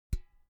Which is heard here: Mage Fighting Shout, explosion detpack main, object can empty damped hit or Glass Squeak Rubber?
object can empty damped hit